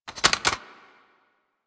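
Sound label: Primary crossbow Reload Grab Clip; Warframe; Tenno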